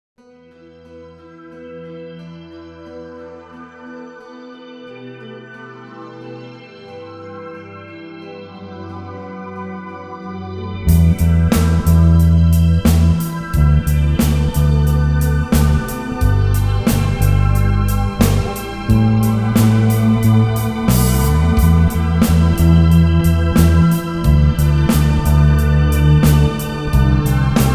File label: bass line